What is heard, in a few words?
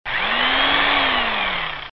Band Saw